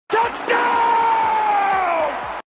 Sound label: touchdown sound effect